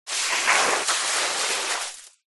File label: Hand digging in sand with pebbles